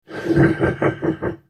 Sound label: spell cast effort; Urgot; en US